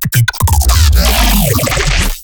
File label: glitch stab; Virtual Riot; Glitch Stabs